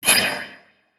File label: weird, sci-fi; sci-fi sfx